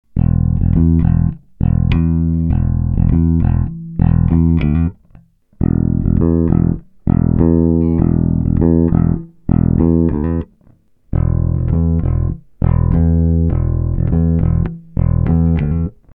Fender Roscoe Pur

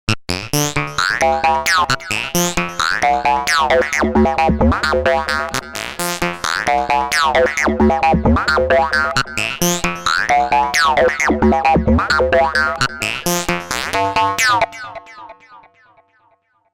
Duck Seq; Absynth

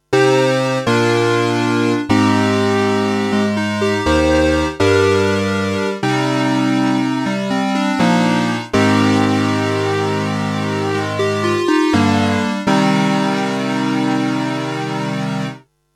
Boss CE-300

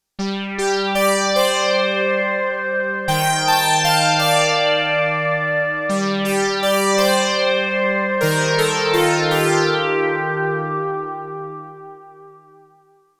Marion Systems MSR2 Polysynth

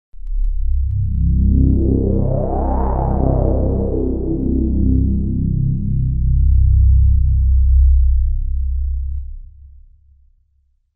Monologue Deep Bass

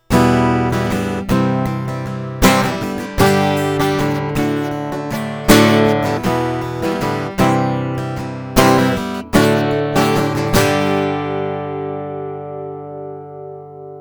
Harley Benton CLD 15CE Flame Koa Exotic Flat

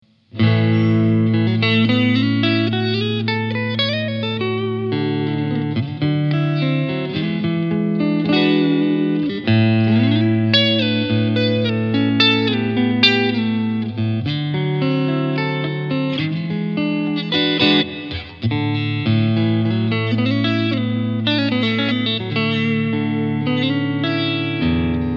Cleansound Voice Reverb

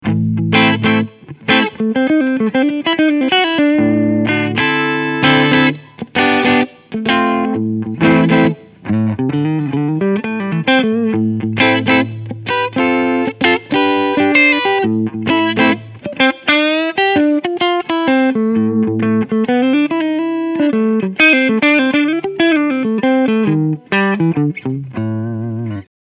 Front Humbucker Clean

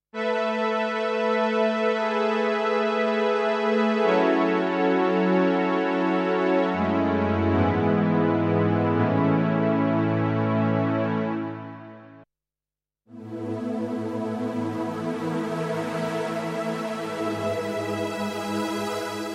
MOX6 Synth Pad

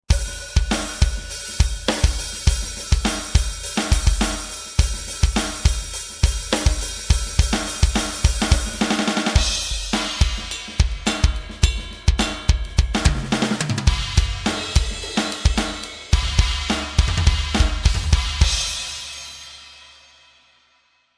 Big Rock Set

